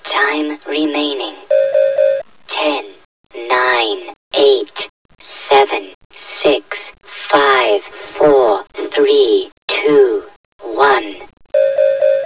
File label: teleporter